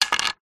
household broom plastic fall over

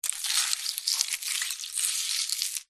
nature ice slushy pieces movement; zapsplat

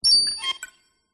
Futuristic Technology and UI Confirm Tone

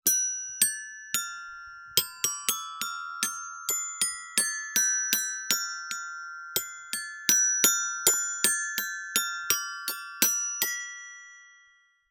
toy glockenspiel being played by child

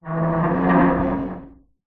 foley metal scrape large heavy; zapsplat

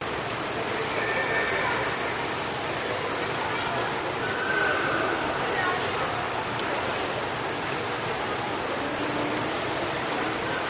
public pool ambience loop; the sound pack tree

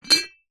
household teapot ceramic lid remove; zapsplat

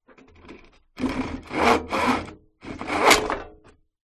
Hacksaw Short

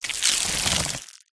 Designed Plastic Elements Liquid; Gregor Quendel

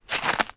human footstep ice; sound effects one

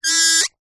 leisure toy squeaky squeak broken

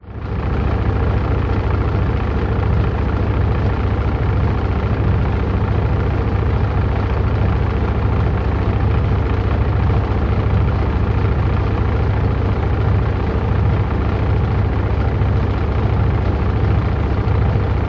household washing machine spin vibrate top loader

